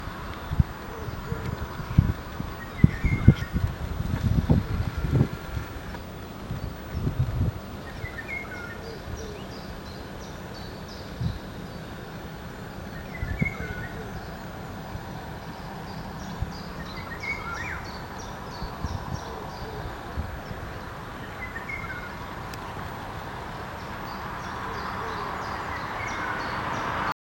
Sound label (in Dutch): wielewaal; vogelgeluiden